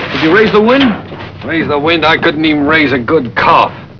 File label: wind cough